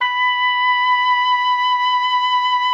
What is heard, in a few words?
B5, OBOE; Xsample VOL-6